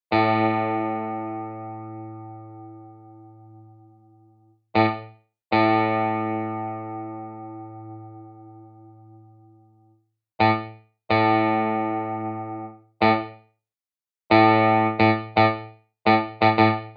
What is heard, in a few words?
One Note Improv